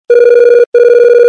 beep

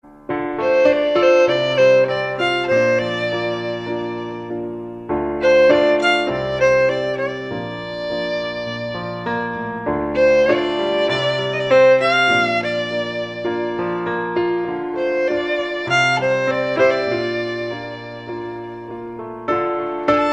violin instrumental

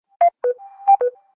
DisConnect SMS

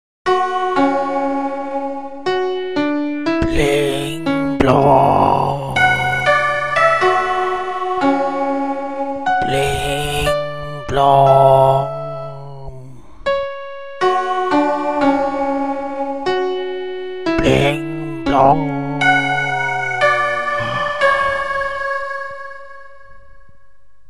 pling plongh pling

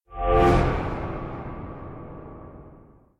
Mail; Hacknet

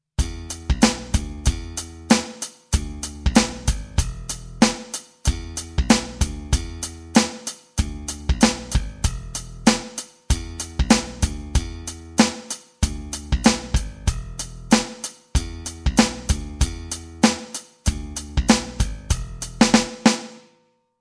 Drum Beat

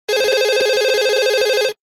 electronic tone

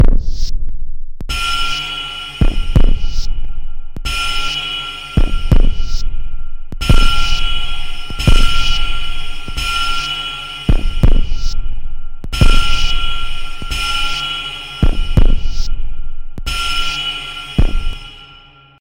Bell Mix

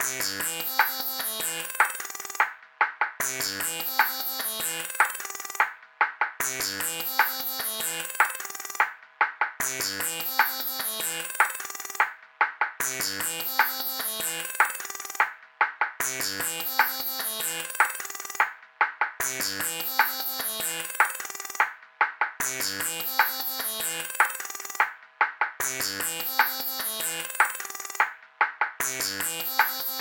Roots Reggae

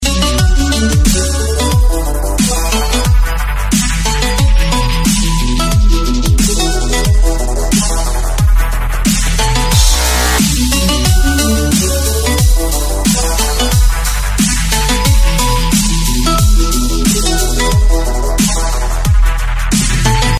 Haunted Electronic

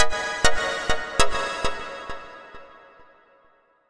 Chimes

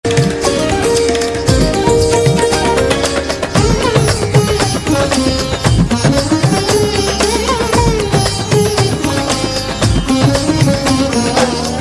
indian jazz

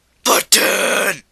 Button; Guthix

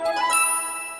click tune; ringtones; games; the sims

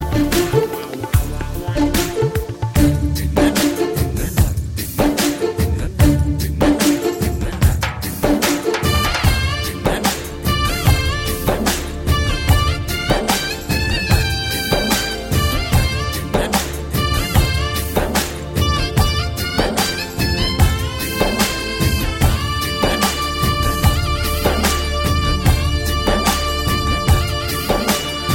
music only

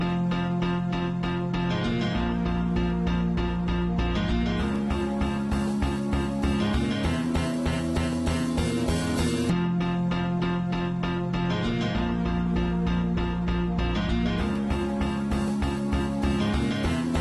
Blackberry torch

